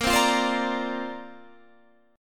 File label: C 7th Suspended 2nd Suspended 4th Bb